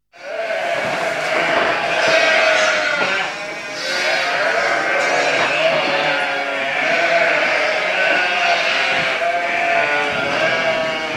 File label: pecora sheep; animali